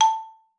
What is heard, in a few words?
A4, xylophone; Xsample VOL-4